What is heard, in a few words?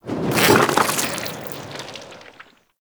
Landscape Fungus Drop; Warframe; Venus Open World